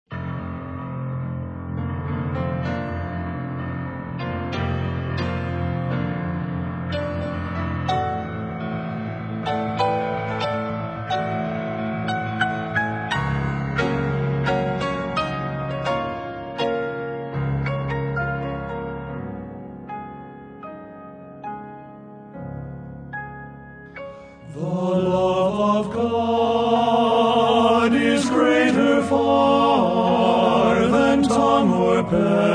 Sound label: Men's Quartet